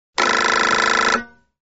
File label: Nokia Reed